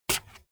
bmx bike tire foot scuff medium

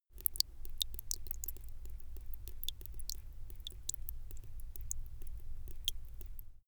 drip

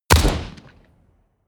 shoot close

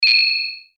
metallic pulsing high

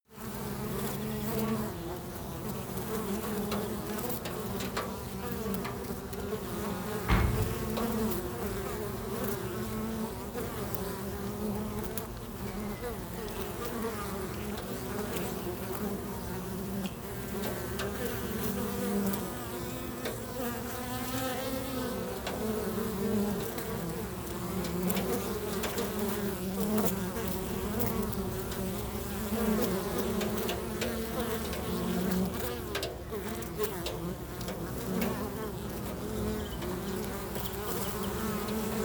bees

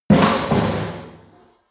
bin tip over

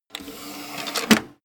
cd tray close

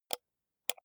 computer mouse wheel click slow

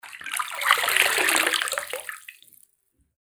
water porring

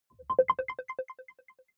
button echo distorted arpeggio

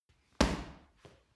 punching bag kick hard

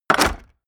medieval click medium positive

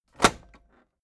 tape deck close heavy

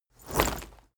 leather armor movement

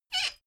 folding chair squeak short

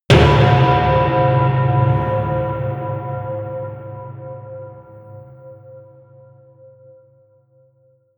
bell punch

